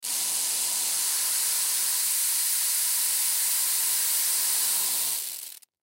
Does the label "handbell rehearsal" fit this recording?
No